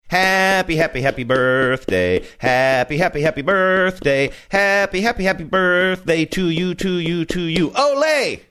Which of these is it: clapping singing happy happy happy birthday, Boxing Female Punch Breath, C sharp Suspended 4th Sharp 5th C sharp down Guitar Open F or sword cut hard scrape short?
clapping singing happy happy happy birthday